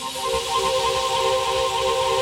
FLUTTERERS; Pro-Rec - Synthsonic

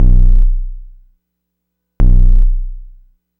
Subtractive Beat

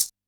shake

